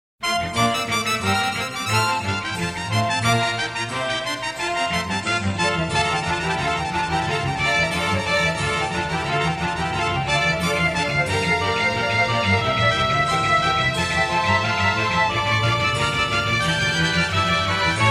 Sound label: Easy Listening & Classical; Sound Ideas